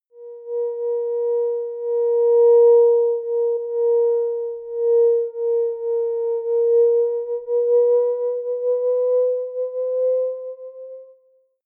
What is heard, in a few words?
simple moving sine long; toene